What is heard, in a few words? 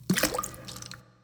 Water Plunge